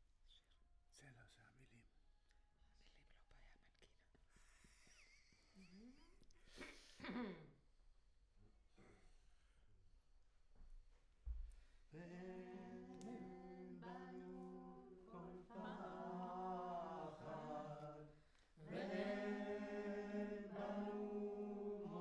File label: Choir